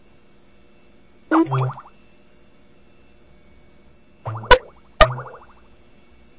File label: Odd Mac Sounds